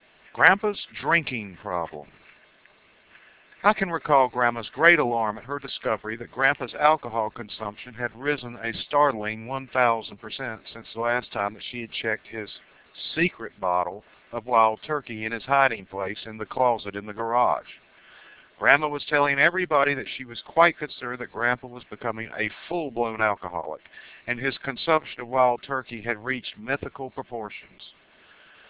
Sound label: drinking